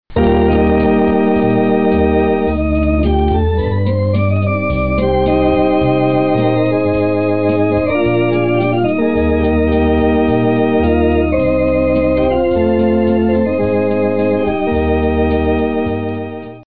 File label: hammond 123j3 organ